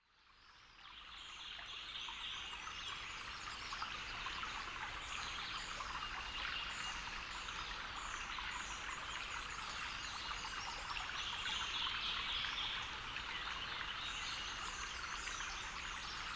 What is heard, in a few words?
Buffalo River Birds and Brooks